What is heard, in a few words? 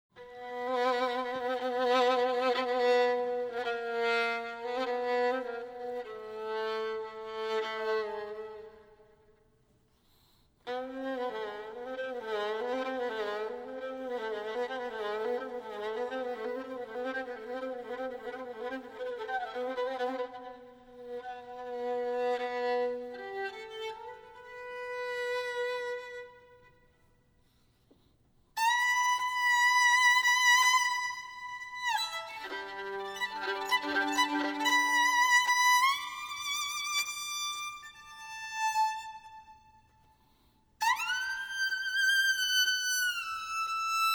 Didge Fusion